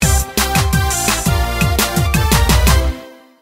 music big prize animation

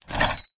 box Open

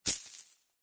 music task and money

